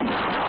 explosion; epiphany

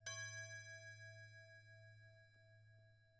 bell tone; angband

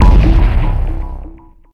explode; redeclipse; weapons

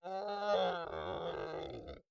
howl long; angband

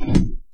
pam; funnyboat

